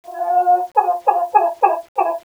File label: hen stand; dm melancholy